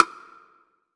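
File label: DR STICK, Drum Machines; EdgeSounds; Drum Mashines VOL-1; X-DRUMS KIT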